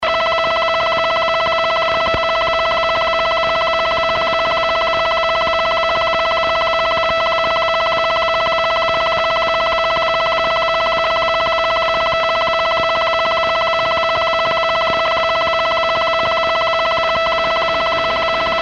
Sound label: AM 21.320MHz 6KHz; PLC; 158uV; UTC 1900; CT4AN